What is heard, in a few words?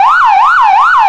yelp, sirens; federal signal pa 640